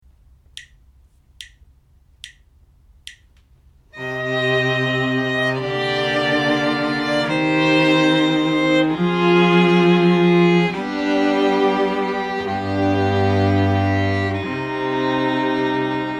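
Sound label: harmonic error detection, string quartet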